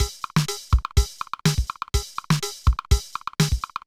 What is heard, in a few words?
Loop, mxr; classic machines